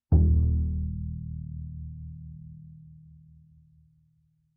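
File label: Bass pizzicato fortissimo sul C Eb1, Double Bass; MIS Pitches - 2014; Strings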